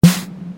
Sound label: SD, vermona drm1